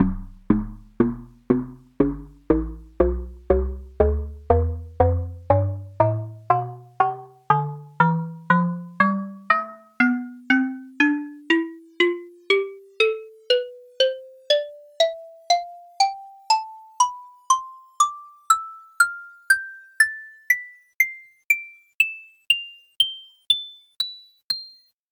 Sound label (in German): xylophone tonleiter